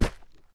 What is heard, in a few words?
footstep; smite; cerberus